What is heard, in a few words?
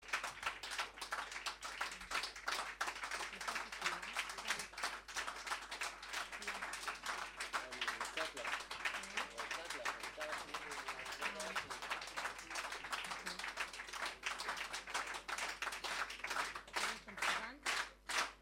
claps; מחיאות כפים; ההופעות הוקלטו